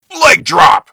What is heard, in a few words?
legdrop